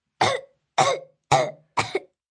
Choking, vomit, 1 child, coughing, gagging, human voice, kid